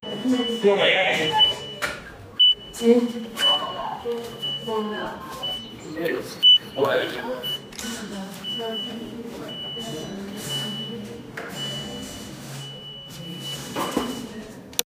hospital beeping sounds; dialogue

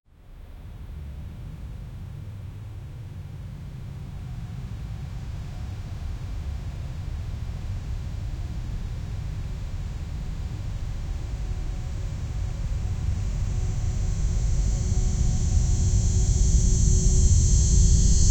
jay jayakara sound effect, chant

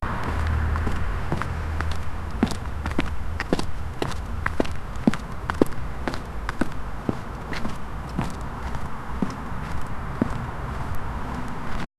running footsteps on a, city

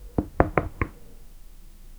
chess move played; sound effect

